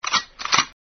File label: gun cocking